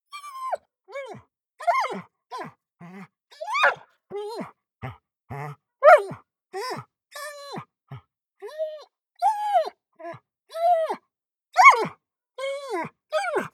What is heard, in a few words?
dog whine heavy; natur-tiere; hunde